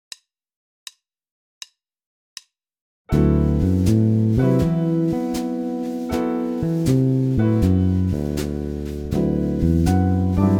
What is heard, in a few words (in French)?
mode mixolydien accord 13, basse; exercices